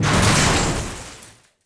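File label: metal shatter large; objects; Star Wars Obi-Wan; XBOX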